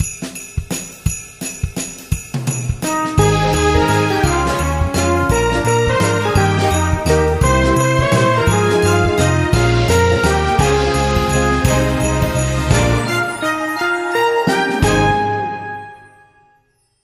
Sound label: We Wish You a Merry Christmas (jazz classic)